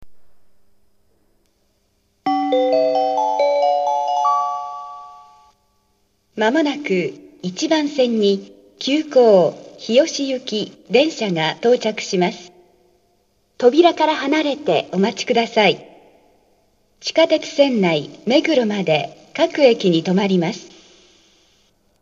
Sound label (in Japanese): sengoku 1 bansen sekkin, subway